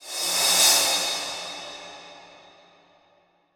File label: big Win Transition; wild turkey